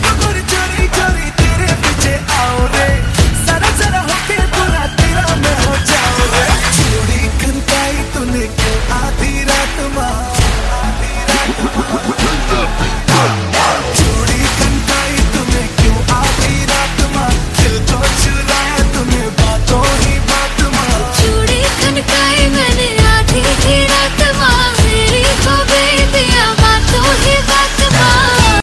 hindi music ringtone, bollywood

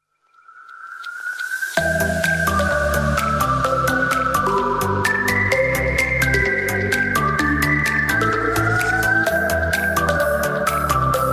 bollywood awesome music ringtone

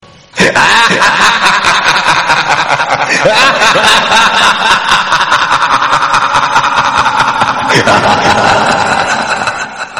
funny man laugh background music tone

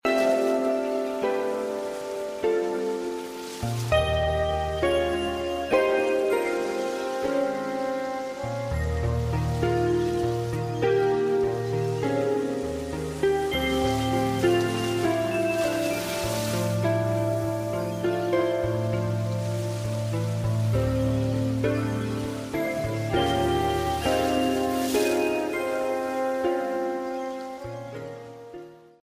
distant sails in sea peace music tone ringtone; iphone

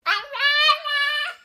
puppy talk ringtone, funny

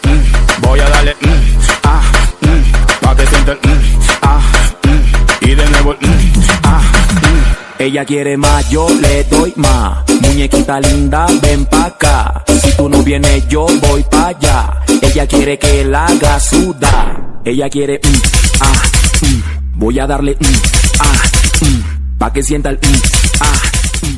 english music ringtone; english ringtones

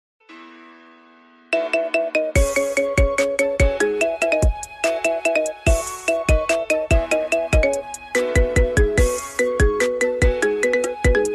dub step beat ringtone

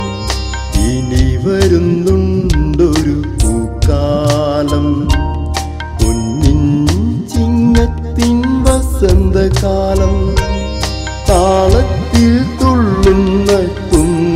new malayalam ringtone

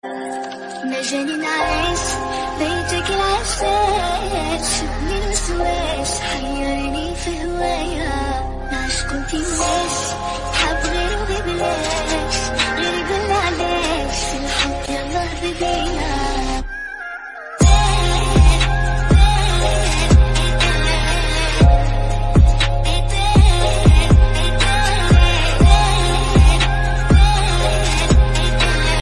arabic ringtone

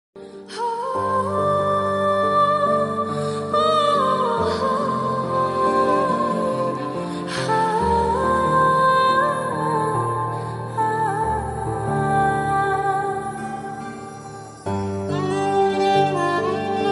sad background music tone ringtone

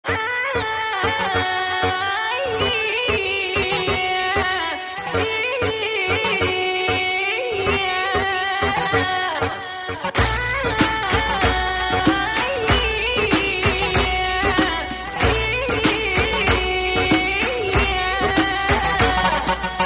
melodious background music tone

